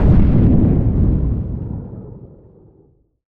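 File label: incendiary grenade detonate distant; arccw go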